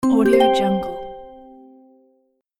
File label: magical harp level complete